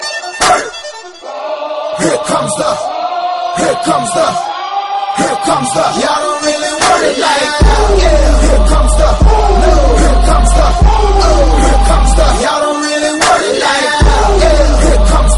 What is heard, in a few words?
Dha Boom